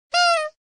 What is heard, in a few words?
Nose Honk; FNAF